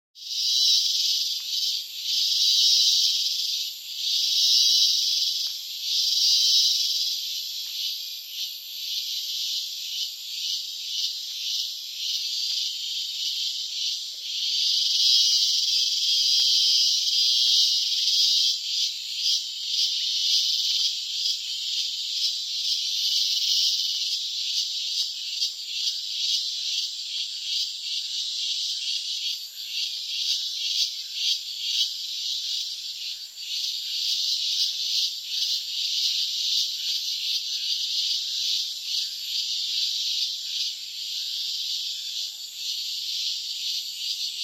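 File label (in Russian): sverchok zvuk, cricket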